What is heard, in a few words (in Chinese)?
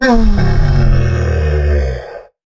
骆驼坐下声